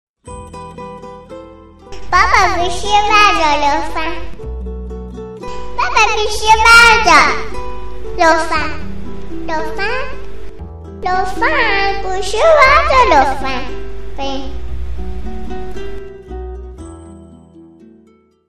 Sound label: Mobile ringtone